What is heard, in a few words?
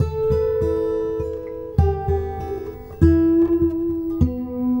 Red Trees Gtr, Guitar Only